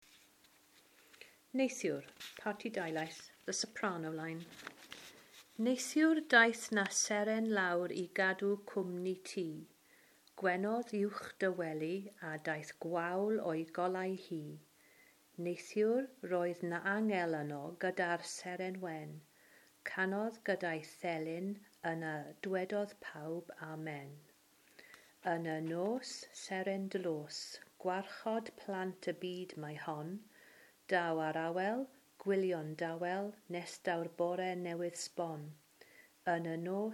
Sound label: soprano